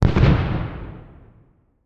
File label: Explosion Distant Firework; Fire and Explosions